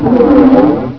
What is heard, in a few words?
panther pain; svencoop; custom monsters